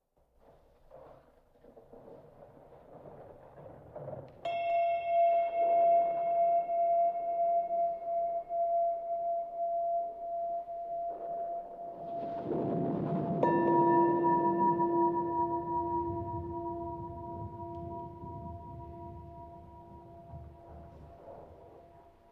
Yoga Background of Tibetan Bowls & Approaching Storm